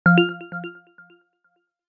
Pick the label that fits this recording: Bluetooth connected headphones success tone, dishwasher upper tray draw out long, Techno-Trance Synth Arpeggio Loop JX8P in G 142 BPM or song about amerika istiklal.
Bluetooth connected headphones success tone